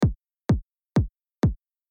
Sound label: kick